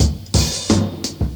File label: i'll bet you, Drum loops Boombap